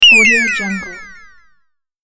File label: pixel platform fall; sonic-boom